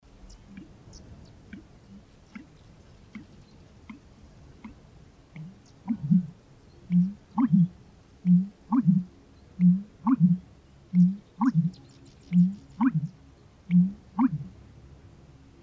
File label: american bittern; Crex Meadows 5/4/04